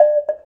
African Cowbell; Roland BK-5; Percussion

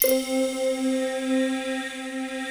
sand; East West; Global Sounds

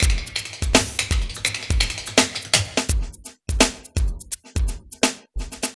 LOOPS

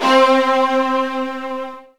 Virtuoso Strings; ILIO; Kirk Hunter Virtuoso Strings VOL-2

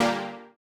Housey Stab A#2; KG MegaSaur

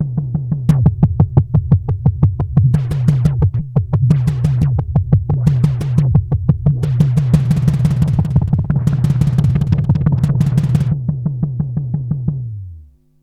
Korg Prophecy hits, arps and fx

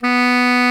TENOR SAX B3; Greytsounds VOL-1

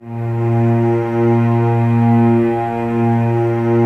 Cellos A#2, String Ensembles, No Vib; Miroslav Vitous

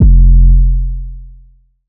bass classic round F; Polyend; ARC Noise

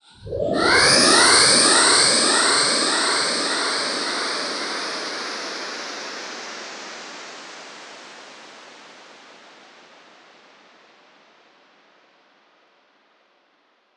old fi whoosh; One Shot FX; Sample Magic; Transmission-X

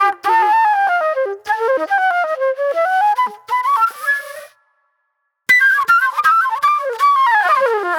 Fast Funk Flute

